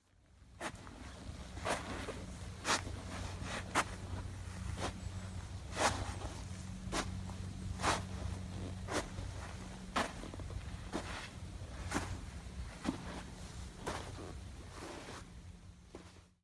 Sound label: walking sand